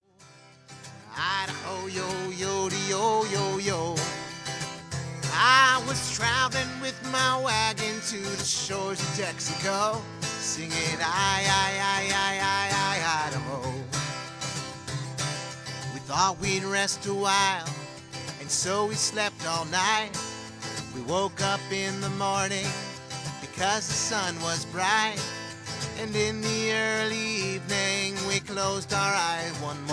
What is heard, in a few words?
humor acoustic